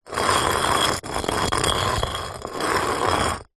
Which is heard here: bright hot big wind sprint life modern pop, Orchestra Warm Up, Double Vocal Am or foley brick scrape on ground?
foley brick scrape on ground